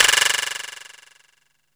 VIBRASLAP; PERCUSSION; Back In Time Records - Big Bang

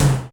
Yamaha RX-120 Tom El Mid

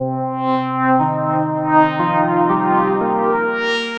Marian String; Sonic Foundry (Sony Creative Software); The Electronic Music Manuscript Collection

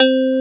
BELL; CMP - Analogon

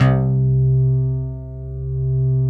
OBDX GTR; Synclavier Sampler Library; KEYS AND GUITARS

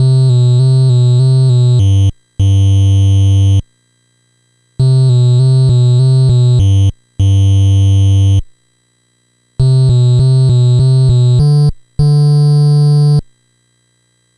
NES Bass, chiptune; Sonic Foundry (Sony Creative Software) - 8-Bit Weapon A Chiptune Odyssey; Nintendo Entertainment System

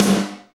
SD Real, Roland; Sound & Vision - Gigapack I

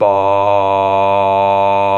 VOX, Male Scats

